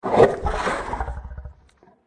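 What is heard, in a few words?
spider attack voice bottom; pauchiha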